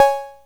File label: perc, opl3; M8